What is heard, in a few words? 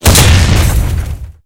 tank hit aphe small; tanks; War Thunder